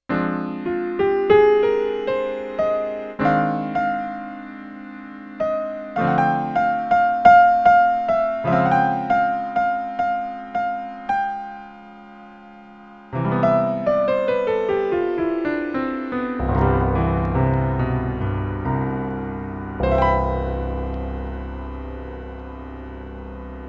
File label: Mellow Piano